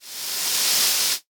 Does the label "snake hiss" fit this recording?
Yes